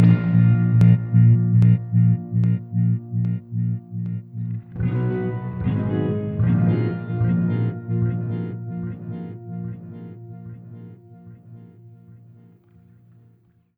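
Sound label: Rhythm Guitars A#, Lounge; Zero-G Kocktail Kollection; Construction Kitz (Lounge); Volcano at Sunrise 074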